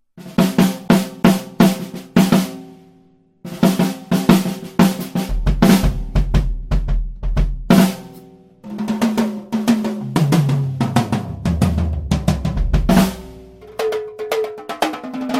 binaural drum recording using roland cs 10 em mics; freakyfwoof turbostream